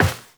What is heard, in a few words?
Step Metal, Footsteps Walk; Warframe; Characters; Tenno